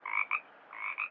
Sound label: frog; animal